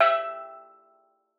E4, STEEL DRUM; Xsample VOL-4

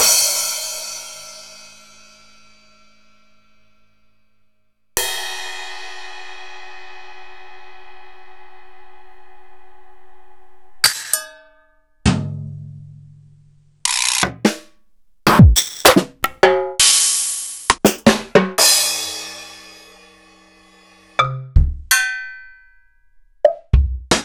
EMU PX-7; m8

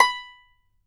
banjo B5, banjo notes; Sampleheads - Whole Lotta Country 1 & 2

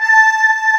CHOIR, E.POP

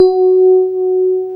PIA DX E-PIANOS

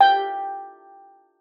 steeldrum G4; Xsample VOL-4

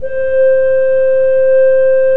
KOPPELFLUTE C4, Cathedral Organ; Propeller Island

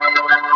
Synth O Matic; Mo' Phatt; Digital Sound Factory Mo' Phatt

